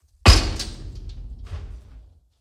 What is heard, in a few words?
Impacts SIMPLE; soundbits